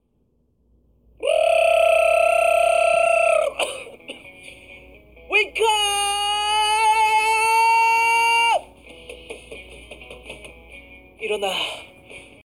Wake Up Call

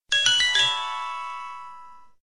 Nokia sms ringtone

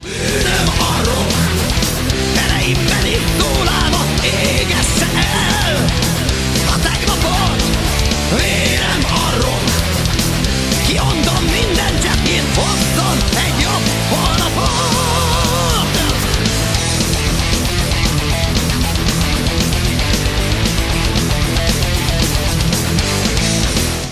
hard rock

